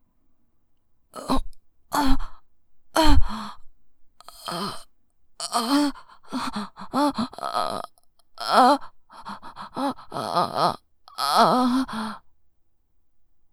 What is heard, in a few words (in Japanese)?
喘ぎ声 オホ声